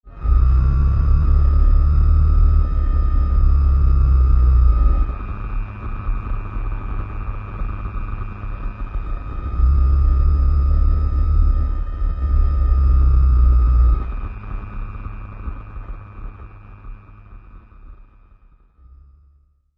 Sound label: Sub Zap Blast, thriller-horror ambience sound; Scary Soundscape Sound Effects